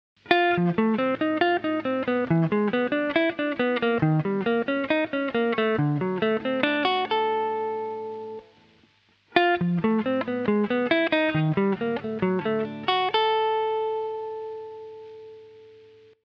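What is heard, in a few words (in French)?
arpèges sur substitution tritonique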